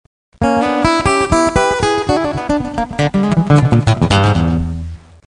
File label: acoustic blues